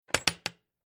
Household Old Wooden Writing Cabinet Large Chest Draw Handle Released Three Parts; Tom Hutchings